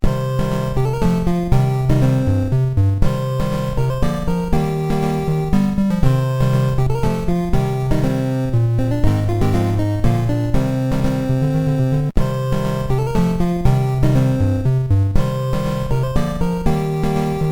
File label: Combat; u4-mockingboard